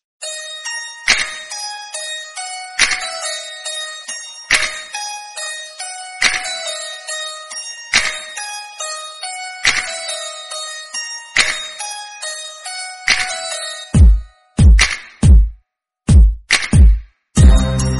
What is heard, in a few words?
fun nice background call tone ringtone; galaxy ringtones